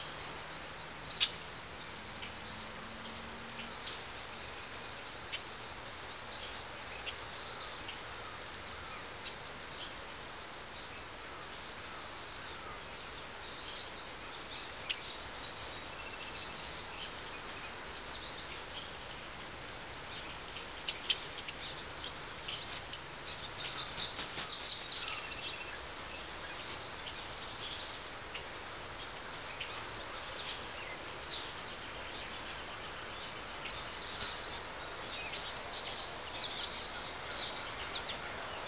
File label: ambience; Street Hoops; XBOX